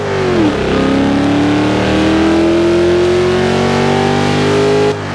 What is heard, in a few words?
second, vehicles; gmod; tdmcars; raptor svt